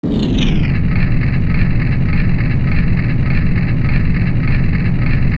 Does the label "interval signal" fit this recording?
No